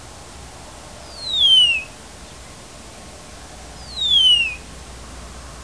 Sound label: starling, birds